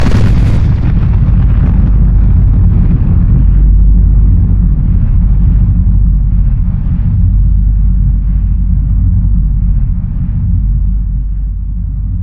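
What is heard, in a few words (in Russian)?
взрыв истинной главной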